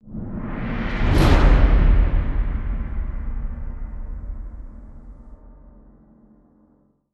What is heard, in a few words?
CYMBAL ROLL HIT; gamerip; SYBERTRONS FATE